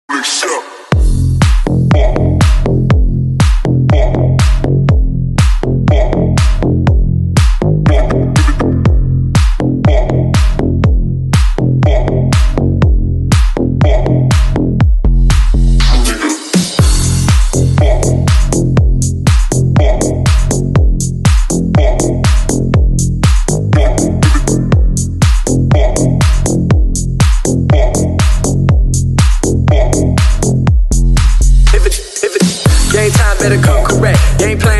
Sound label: Mobile Ringtone